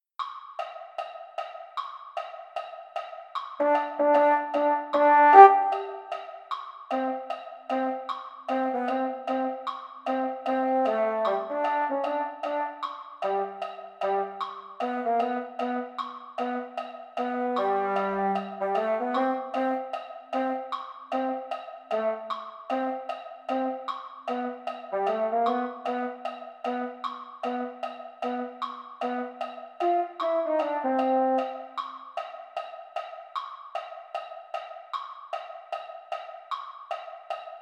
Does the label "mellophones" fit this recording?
Yes